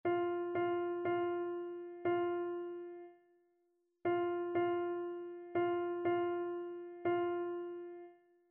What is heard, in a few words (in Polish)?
dyktando rytm